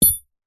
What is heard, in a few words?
industrial metal screw or bolt drop on another on carpet